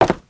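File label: footsteps wood; Spearhead Demo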